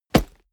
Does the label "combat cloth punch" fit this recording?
Yes